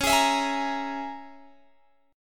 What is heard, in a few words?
C sharp Minor 6th C sharp, Guitar Open G